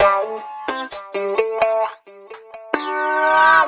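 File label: guitar, Roots Funk; USB Soundscan vol.41; AKAI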